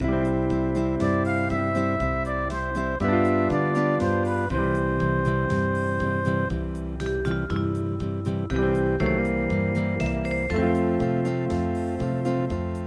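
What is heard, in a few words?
Hawaiian; SANOE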